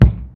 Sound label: foot wood, footsteps; midnight guns